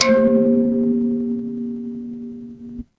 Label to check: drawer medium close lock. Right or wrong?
Wrong